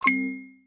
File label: livechat incoming message